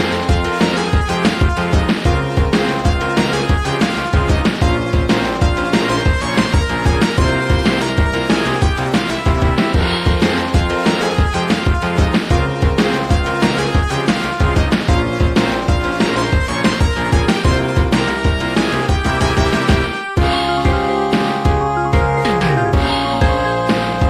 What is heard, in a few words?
music; spainfighter